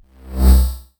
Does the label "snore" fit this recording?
No